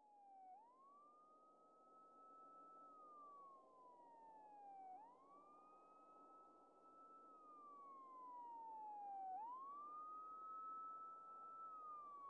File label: Firetruck siren approaching and shutoff pure